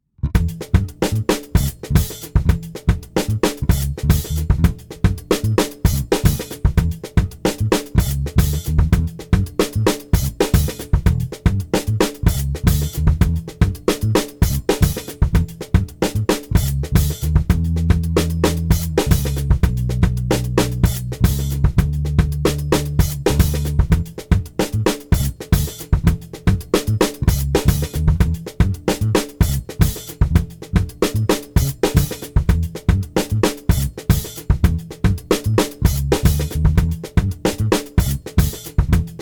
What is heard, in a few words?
funk